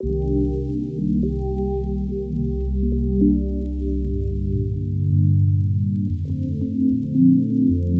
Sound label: 120 Cmaj7 night move, Music Loops; Touch Loops; BlissedOutBeats